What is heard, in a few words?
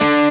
x x 6 x 4 crunch